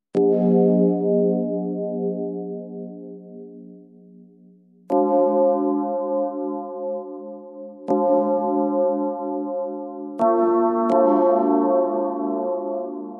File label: Real Bell